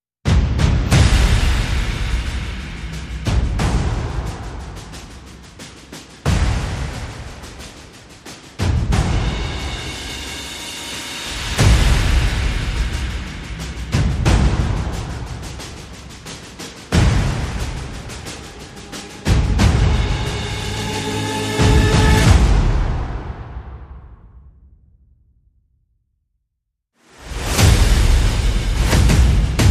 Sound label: Perc and FX